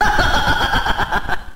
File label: laugh cartoon